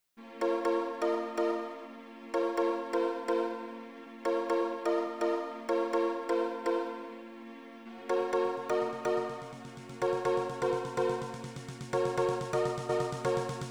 fitness music 432 hz; 125 BPM; oompa oompa

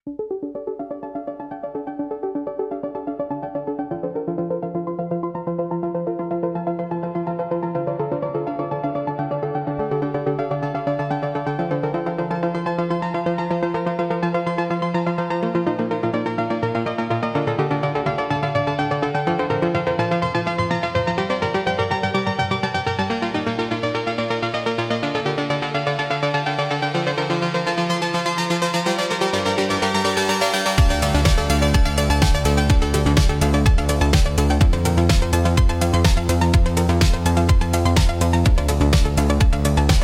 Electronic Impulse

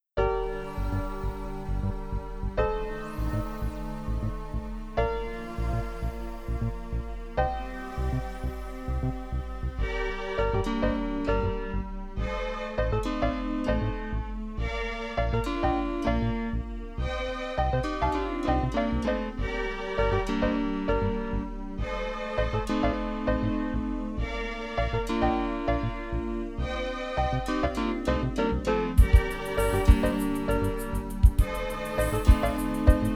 fitness music for stretching 432 hz

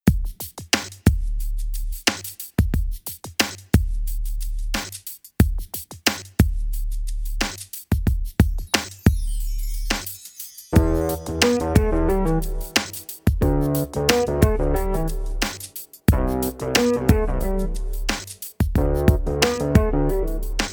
dubstep 432 hz

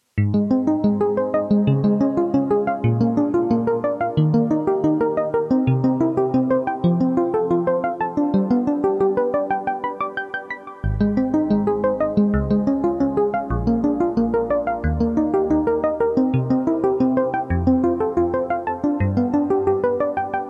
ambient with nature; passion of life

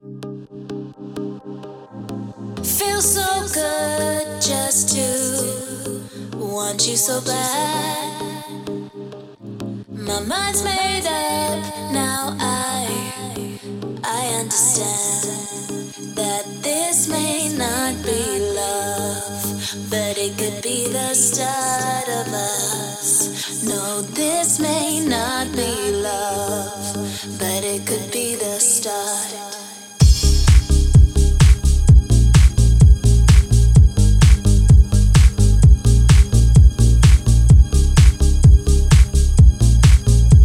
House Grooves; House Grooves Unleashed; Night Impulse

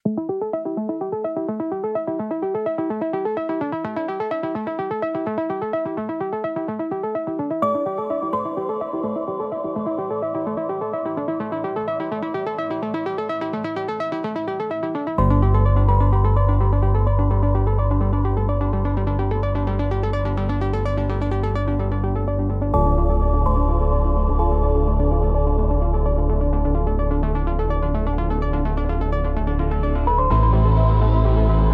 Electronic Impulse

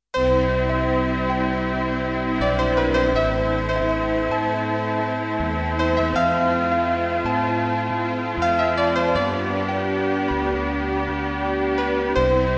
spa music 432 hz

